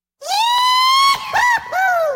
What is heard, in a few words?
Giggle